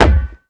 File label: ball impact ground